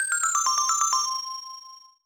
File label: Item Coin; Boing! Docomodake DS